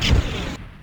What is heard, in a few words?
Fire Ball Hit; Bowser